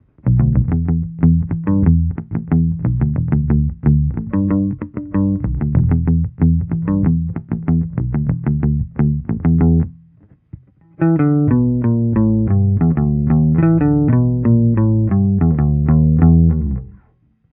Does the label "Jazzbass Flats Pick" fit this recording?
Yes